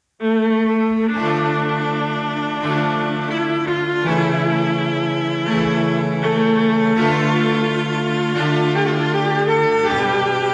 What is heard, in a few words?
Brahms Sextet II. Andante ma moderato